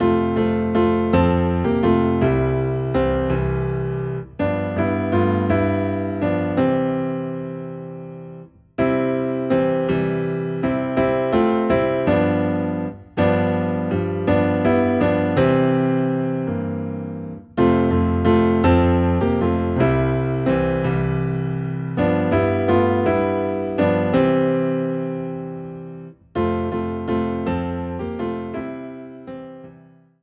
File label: Piano Low in C, hymns